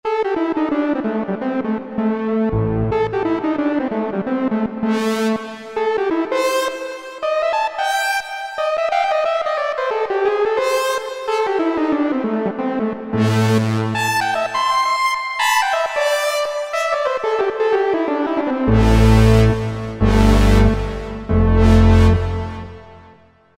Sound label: Strings; Cheetah MS6